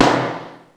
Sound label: Fairlight CMI III Snare